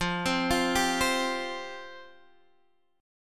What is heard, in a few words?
F Suspended 2nd F down Guitar Standard; arpeggiated; JGuitar